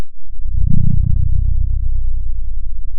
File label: pop, Sound of a dinosaur